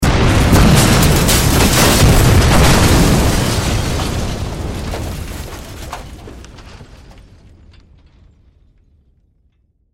Explosion Multiple Debris Blasts; Military; Warfare; Blastwave

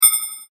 multimedia rollover, Multimedia Rollover Tone

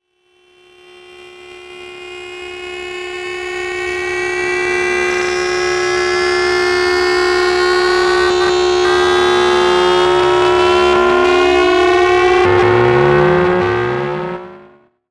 Electrical Crescendo Static Warble; Blastwave

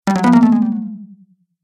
Falling Fail Slow, video game sound effects

